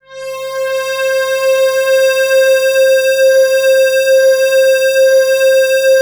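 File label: PAD C5 left, Jungle; USB Soundscan vol.05 - Explosive Jungle; AKAI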